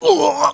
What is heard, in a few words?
pain, male